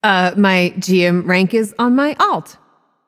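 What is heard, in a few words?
boohoo, female voice; smite; voicepack; hera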